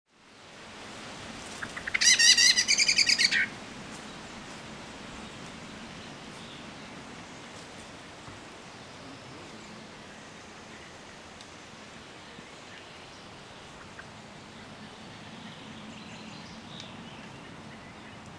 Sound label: Turdus merula; SETE